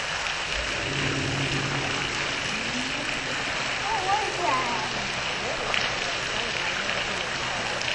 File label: noise ashcave